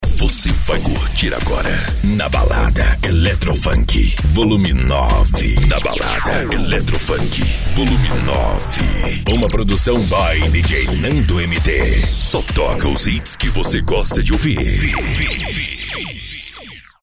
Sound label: electro funk; na balada